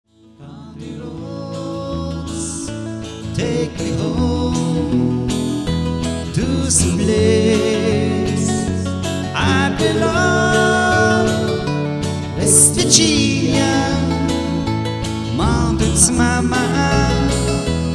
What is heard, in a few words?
Country